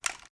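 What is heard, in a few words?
weapon foley assault rifle reload fast rattle; gmod; viper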